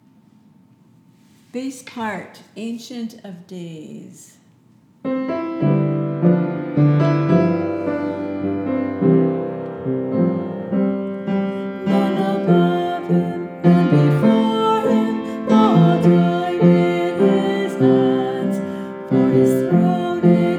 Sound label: Bass